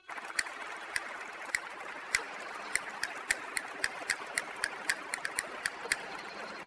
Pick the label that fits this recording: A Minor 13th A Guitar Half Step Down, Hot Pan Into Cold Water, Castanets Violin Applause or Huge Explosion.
Castanets Violin Applause